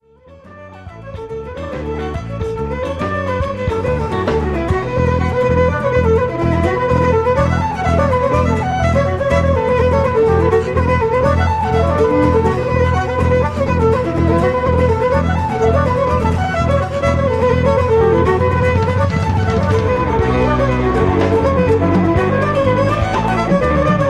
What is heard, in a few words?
Celtic Rock (reels)